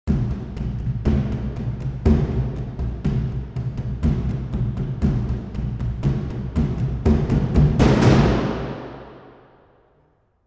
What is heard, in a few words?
ancient timpani beat with tension